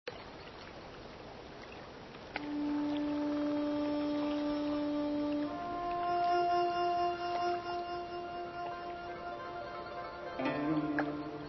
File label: Ambience - Moon; Angry Birds McDonald's